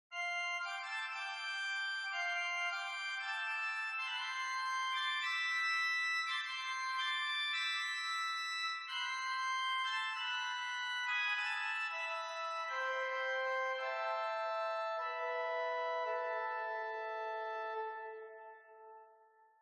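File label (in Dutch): mixtuur, orgel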